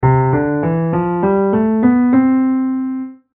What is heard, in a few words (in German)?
C dur m okt